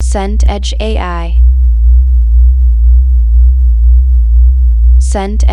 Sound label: horror sub pulse slow